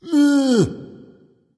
tongue, voice; smite; voicepack; ymir

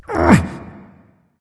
nv grunt lift; cernunnos

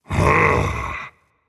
sigh rage; chaac

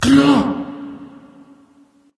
nv breath; Smite; awilix

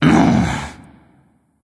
"ugh"; smite; voicepack; sylvanus

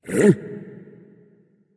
confused; smite; osiris

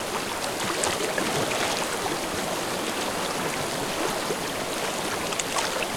ambience water calm river; smite; conquest

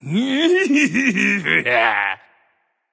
nv yay; smite; voicepack; xbalanque

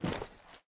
armor; smite; horus

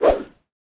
minion swing big; smite; npc; roman archer

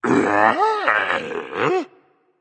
confused berserk; cuchulainn

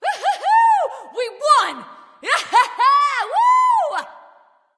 nv yay; smite; awilix

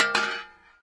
sword sheath; smite; Ares

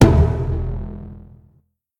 Fire; smite; raijin

